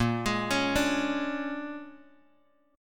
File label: A sharp 7th Sharp 9th A sharp down Bass Standard; A#7#9 arpeggiated; JGuitar